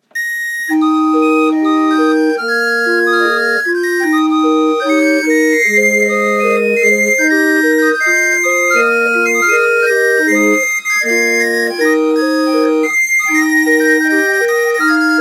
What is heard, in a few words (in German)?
drehorgel; taylor; drehorgeltag 2018